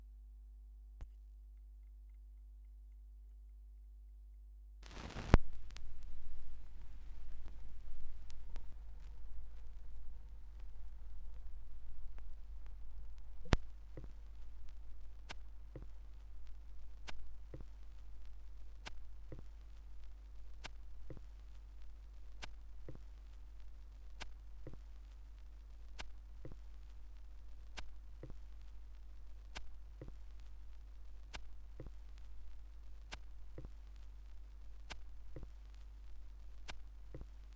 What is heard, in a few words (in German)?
schallplattengeraeusche